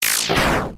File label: damage; deltarune